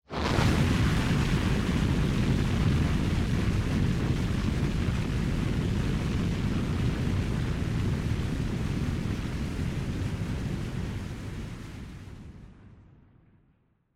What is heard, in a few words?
Fired Up; Onoma-Fired Up